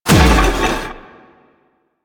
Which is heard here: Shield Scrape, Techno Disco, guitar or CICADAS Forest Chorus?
Shield Scrape